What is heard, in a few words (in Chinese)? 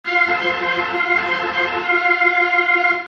主场风琴上行; 战中; 战斗音效; nba